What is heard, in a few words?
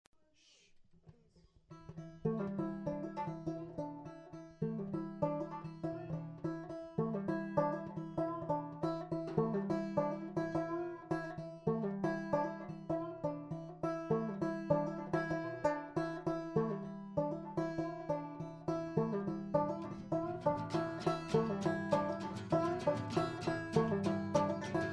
banjo guitar